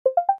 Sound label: Set Power Mode; T390K Wine 3G; LG